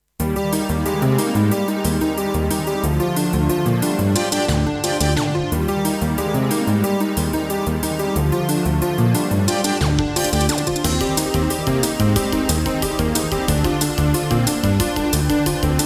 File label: AWE32 GM; Mega Man II; Bubble Man